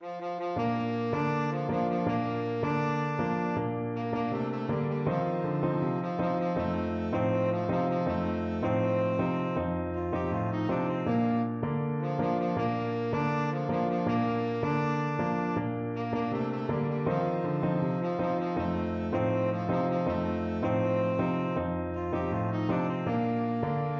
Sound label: tenor saxophone